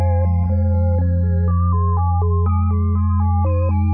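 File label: 122 BPM Sequence C major A minor Tonic F; innovative sequences 1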